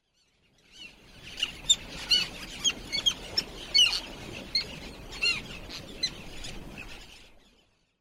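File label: Roseate Tern, Miyako; beniajisashi; koe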